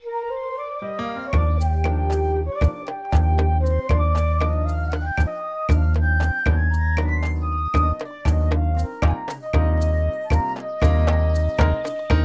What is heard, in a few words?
bossanova; ponyTown